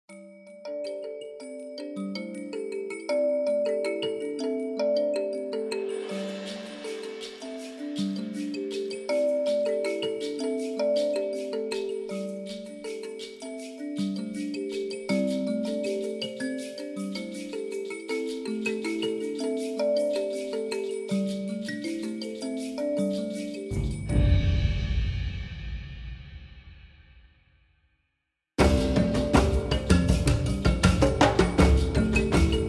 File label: Africas Pride Small Ensemble, Percussion; Relentless Percussion; Gothic Storm Music